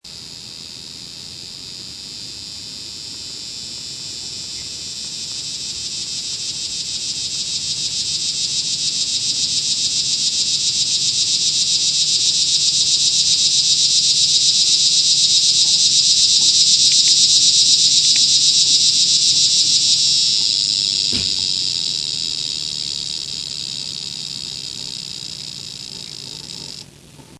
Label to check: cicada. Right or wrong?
Right